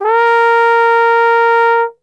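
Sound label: A#3 trombone glissando, Brass Super Section; Best Service; TRMB GLISS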